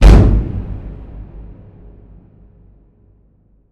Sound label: impact large hallow; Hits, Impacts